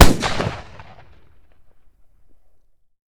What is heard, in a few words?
fire usas dist, weapons; Urban-Coalition; Decay; arccw ud